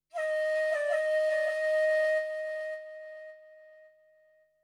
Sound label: flute, ethno, 160, C